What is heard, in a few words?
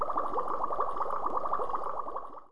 bubbles; Digital Sound Gallery - Volume 1; animate